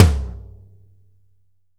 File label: TOM D, Drum Dry; East Collexion; Drum 1